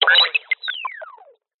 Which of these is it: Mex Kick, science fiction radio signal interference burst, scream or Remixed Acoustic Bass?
science fiction radio signal interference burst